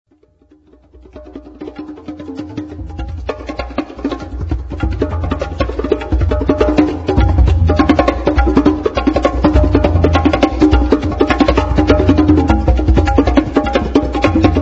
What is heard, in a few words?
taiko tabla live